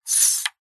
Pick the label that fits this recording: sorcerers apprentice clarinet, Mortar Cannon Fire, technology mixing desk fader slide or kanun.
technology mixing desk fader slide